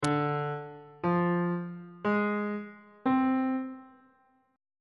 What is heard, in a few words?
c double sharp half diminished 7th chord intervals